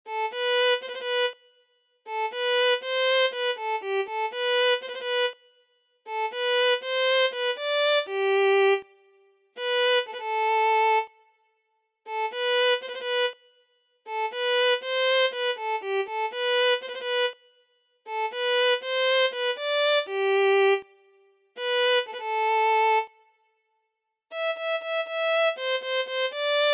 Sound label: Violin